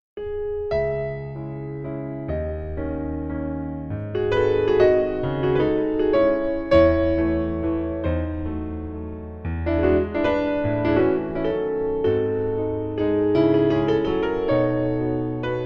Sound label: Venice Grand D274